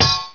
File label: staff hit wall; Heretic II Demo